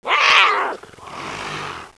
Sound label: jaguar cub sounds